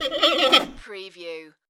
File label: creature talk